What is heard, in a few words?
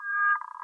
Xenopus itombwensis